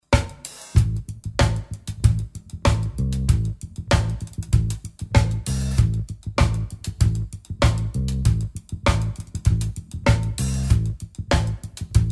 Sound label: 16th funk